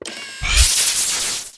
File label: shocklance activate, weapons; Tribes2